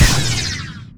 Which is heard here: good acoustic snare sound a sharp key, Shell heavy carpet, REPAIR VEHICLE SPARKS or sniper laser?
sniper laser